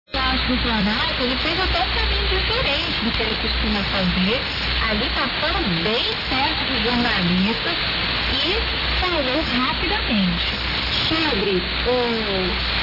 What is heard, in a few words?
4.885 MHz, AM; R.Clube do Para